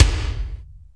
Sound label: Kick, Akai MPC500; Toolkits